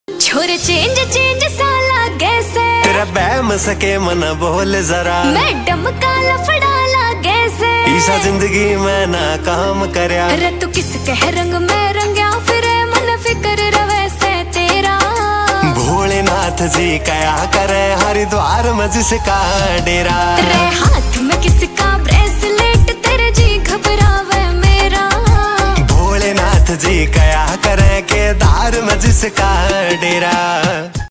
haryanvi ringtone

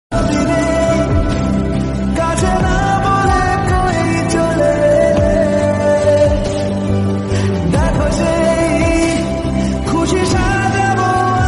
bengali ringtone

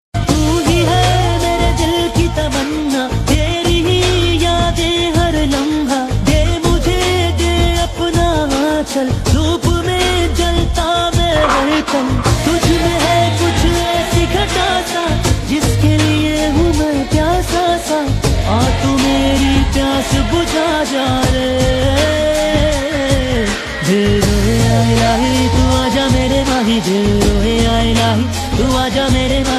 hindi ringtone

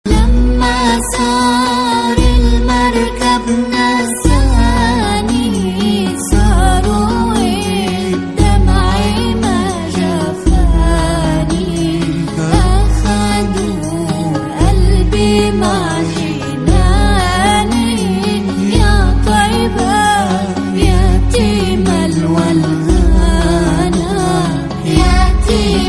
arabic ringtone

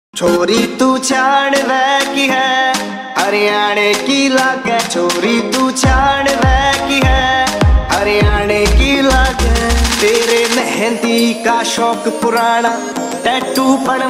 haryanvi ringtone

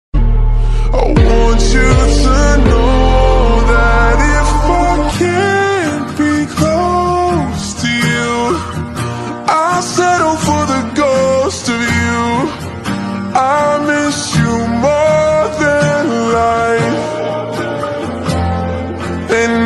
lofi english ringtone

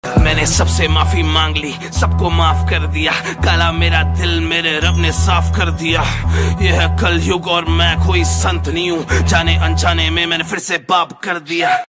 rap ringtone, hindi rap ringtone

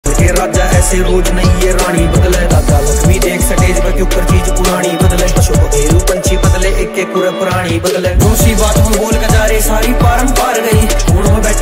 haryanvi ringtone

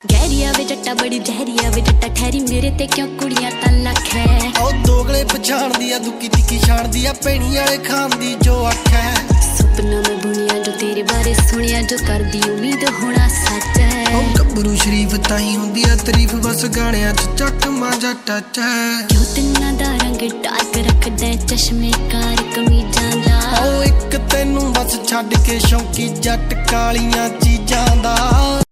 punjabi ringtone

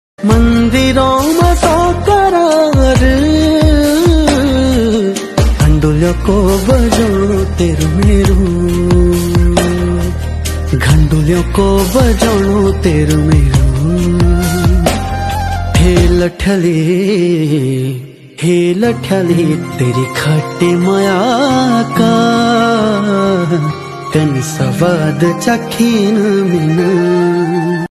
uttrakhandi ringtone

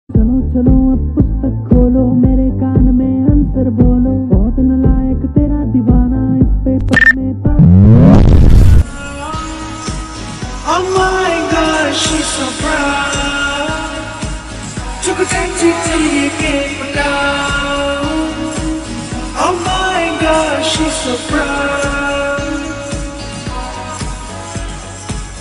lofi hindi ringtone

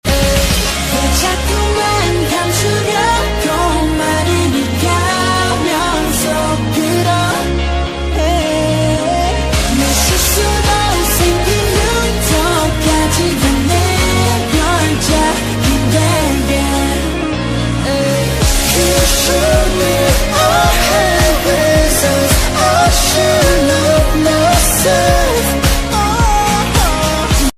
korean ringtone

